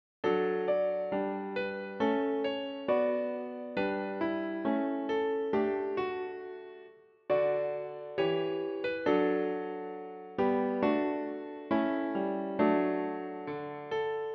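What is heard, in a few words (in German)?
Joy to the world Klavier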